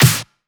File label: Pre-Shifted Claps; Vengeance Samples